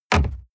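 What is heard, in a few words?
sports car interior glove compartment close short; verkehr-transport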